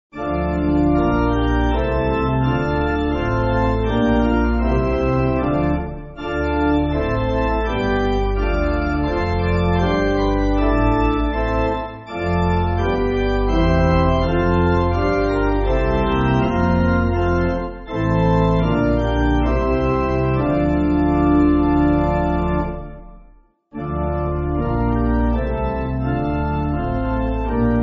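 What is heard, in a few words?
Pipe Organ, Music Backing Files for Churches; Give Great Thanks; Breslau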